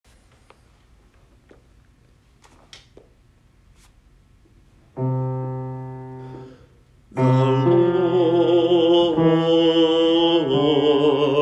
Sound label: shepherd bass